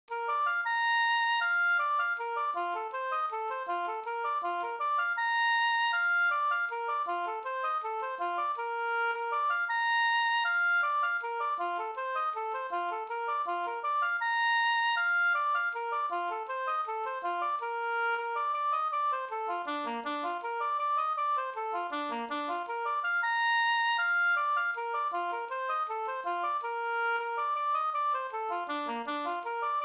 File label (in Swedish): vals